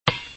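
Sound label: basketball bounce; Dad 'n Me; bball bounce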